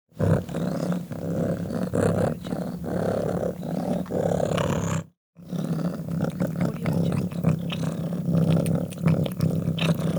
cat purring

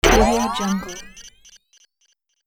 rising game launch

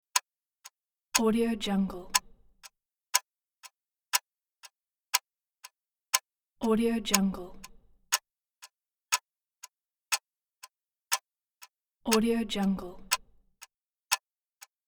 clock ticking; kuksaudio

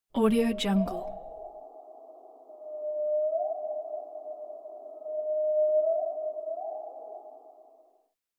jungle bird calls

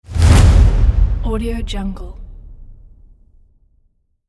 trailer drums hit

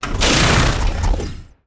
transform impactful chopper

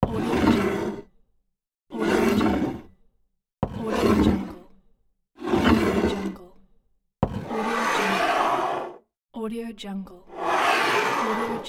glass slide

